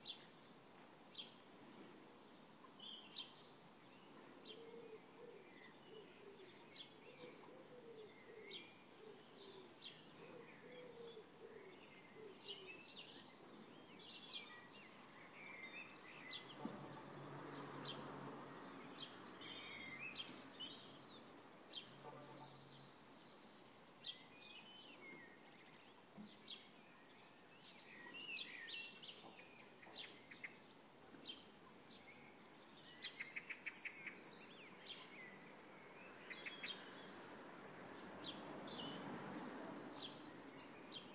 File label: full length birdsong